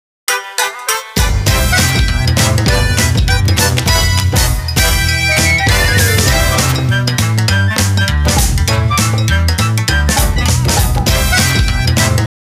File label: Seiseki BGM; Taiko no Tatsujin 8 2006; PS2